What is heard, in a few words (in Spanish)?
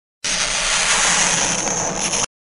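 arañando pizarra; araando pizarra; sonidos insoportables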